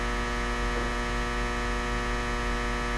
light hum; Source Engine; tf; lair

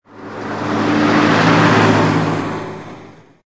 car passby; Source Engine; tf; mvm warehouse

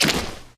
paint, footsteps

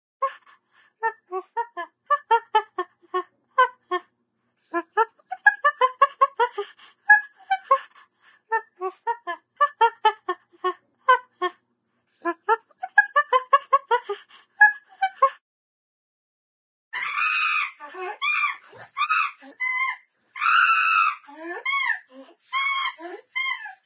chimpanzee light chatter, animal, ape; cartoon

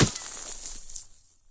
hit snow; weapons; source engine; dod

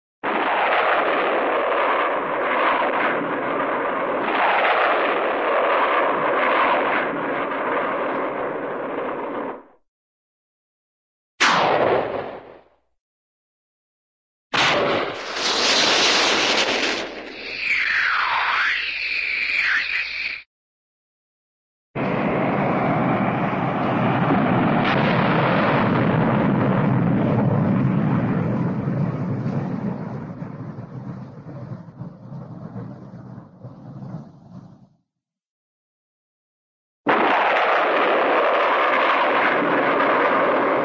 sci fi rocket start blast; cartoon

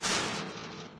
chainlink, footsteps, walk

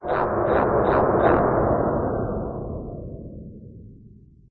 dist grub, ambient, caves; video games; source engine; portal2

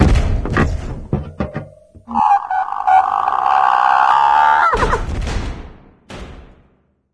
hunter sighting; video games; ambient; source engine; portal2; outland

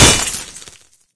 wrench hit world; tf

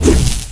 batsaber swing; tf

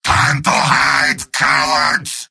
yell; heavy; video games; source engine; tf; mvm

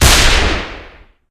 spy revolver, weapons; enforcer; tf

